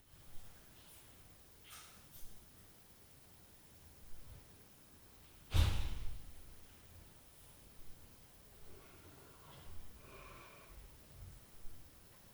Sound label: Door, Night Noise